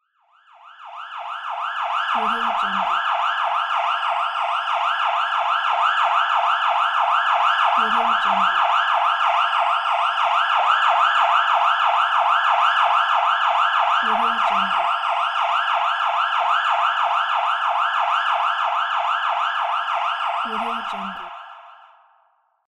ambulance emergency excitation

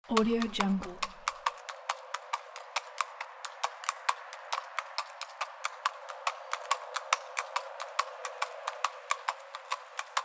horse walking

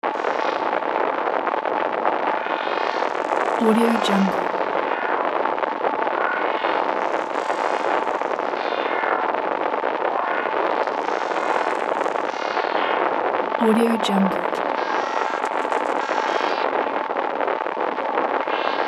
broken communication device; mountainaudio

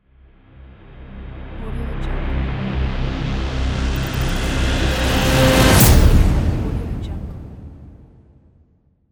atom logo

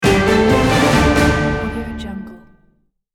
dramatic orchestral breaking news jingle

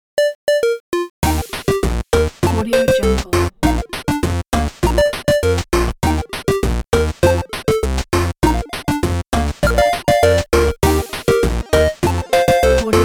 8bit fun game

